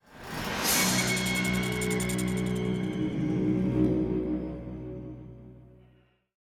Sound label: Music Combat Success; Batman Arkham Knight gamerip